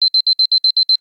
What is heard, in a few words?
music alarm; Samsung GT i7680; alarms